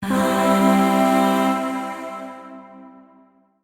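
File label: Void Shawzin Pentatonic Major Chord; Warframe; Zariman; Chords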